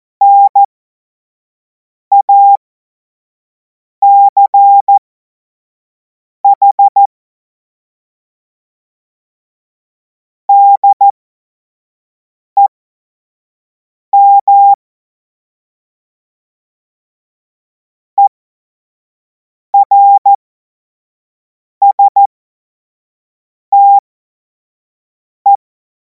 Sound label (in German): Witz, Morsekurs, cw morsen; Tempo 25 F70; hamradio; cw witze